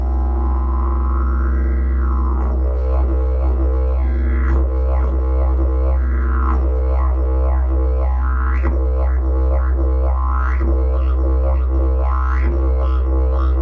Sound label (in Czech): jilm stromotvor, didgeridoo